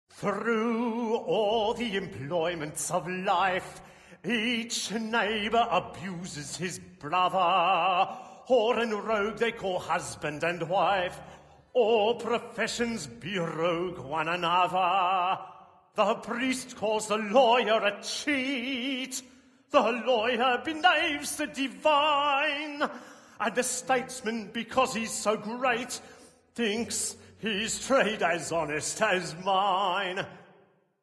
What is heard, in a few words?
Opera Ambience; Assassin's Creed III